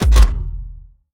gun reload; World of Tanks

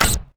ingame cancel

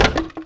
Wood Shield Drop; Civ4 warlords

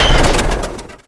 helicopter hit; Call of Duty Modern Warfare

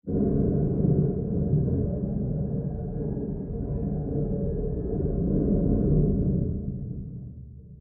damage vehicle; World Of Warships

